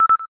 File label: cam timer last; LG; F520L-AKA